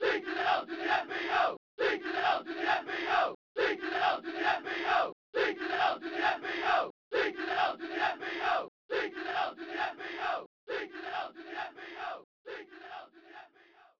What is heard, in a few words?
Chant; Killer Instinct Gold; TJ Combo